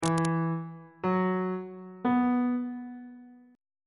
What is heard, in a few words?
d double sharp suspended 2nd triad chord intervals